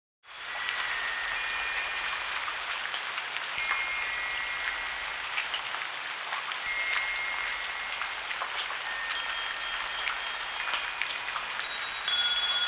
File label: Rain and Wind Chimes